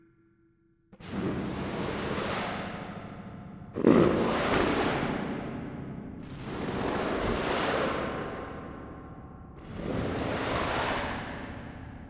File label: Stroking With Fingers